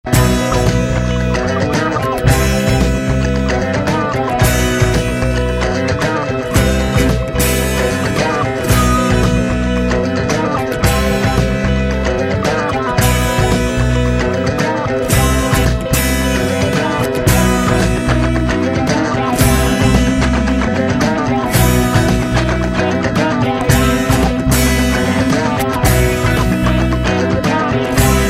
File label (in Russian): pochti dvorovaya guitara